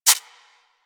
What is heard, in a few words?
Rodent Step Dirt; Warframe